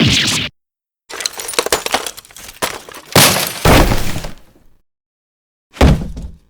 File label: break